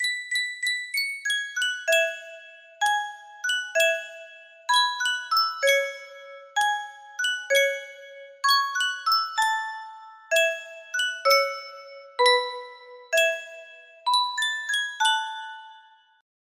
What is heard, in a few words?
Yunsheng Custom Tune Music Box, music box melody